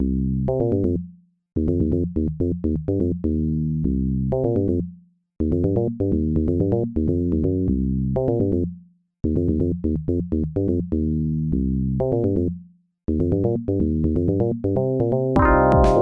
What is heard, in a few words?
funky